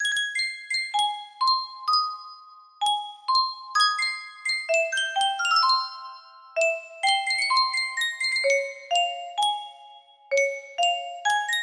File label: custom tune music box; sankyo